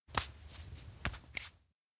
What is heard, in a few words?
pat cheek; anemoi